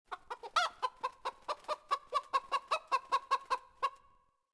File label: chicken; Civ4 warlords; City Ancient Small